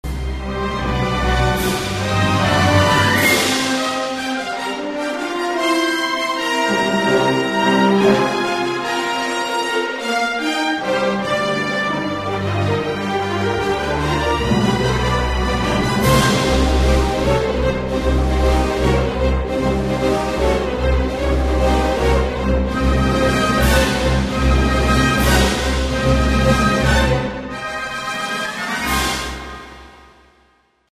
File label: allies success, music; mohaa